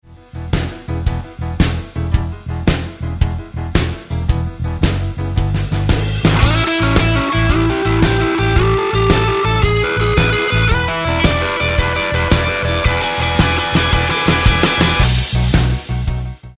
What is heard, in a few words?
Roadhouse Licks Lick, guitar